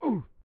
Augh!; Champions World Class Soccer; SNES

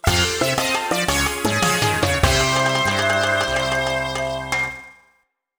Lose Life; Kirby; Classic Music Collection

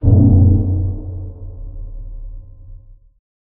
Hit Interior

